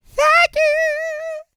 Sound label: GOSPEL; Vocal Planet Vol1 Gospel